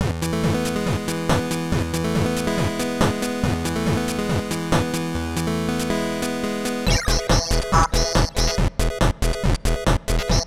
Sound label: Synth, NES Remix